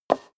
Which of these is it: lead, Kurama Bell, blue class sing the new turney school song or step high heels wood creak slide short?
step high heels wood creak slide short